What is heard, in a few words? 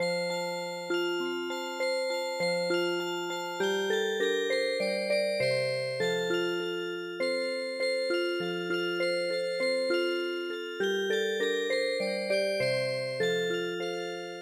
vienna waltz Music Box; midi; traditional